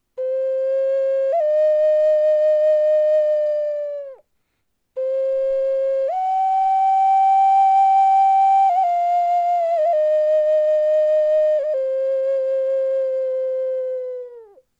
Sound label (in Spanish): Ocarina murciélago